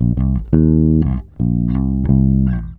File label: FUNKY 86 C, Bass Grooves